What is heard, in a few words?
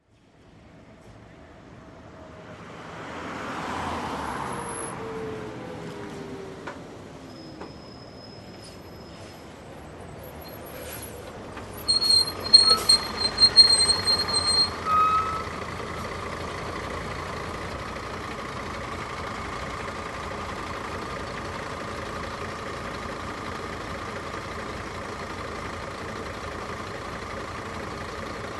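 trolleybus and bus arriving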